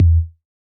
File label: Hip-Hop Kit Bd; Roland Juno-D